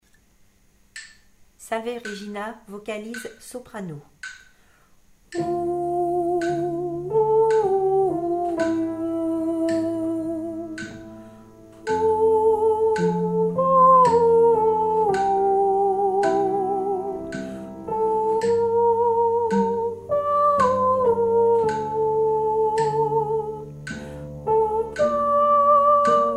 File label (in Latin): salve regina vocalise soprano